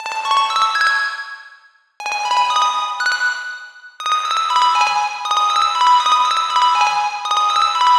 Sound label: Loop Synth 120 A; Deep House; Ghosthack; Advent Calendar 2019